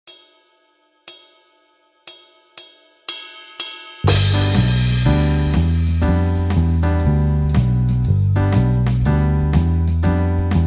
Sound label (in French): basson